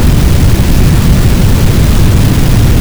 fire

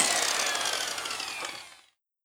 whirdown Short WH

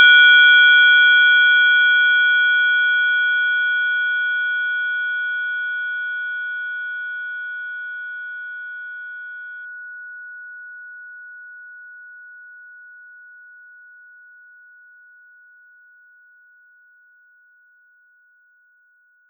tinitus RINGGG

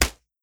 slap

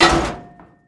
Impact

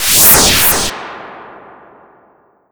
warp

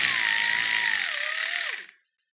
whir down WHRHH

